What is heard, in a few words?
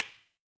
glass crack